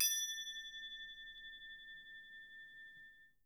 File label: Percussion A3, Harp; Miroslav Vitous - Percussion & Harp